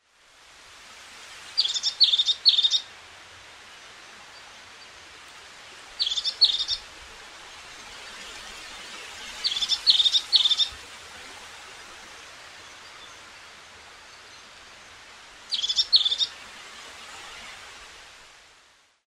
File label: crested tit